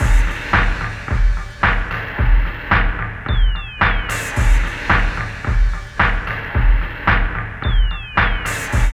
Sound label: LOOP, Tekno Industrial; East West